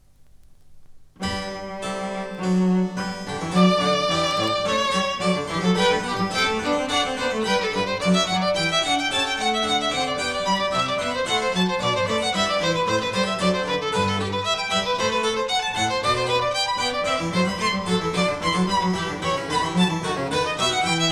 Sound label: Bach Violin Sonata BWV1021